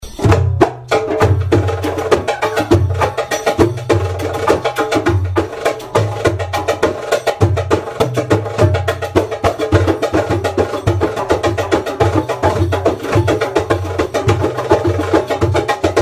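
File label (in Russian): звук барабана дарбука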